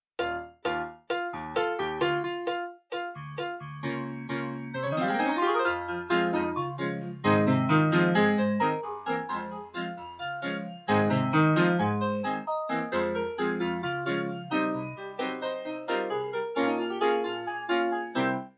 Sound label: audition accompaniment; dragon